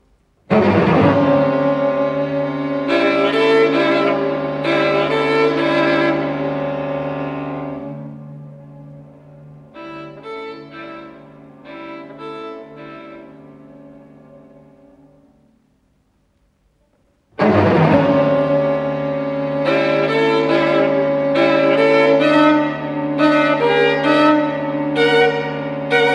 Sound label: String Quartet, F minor, Recitative, Adagio; chandos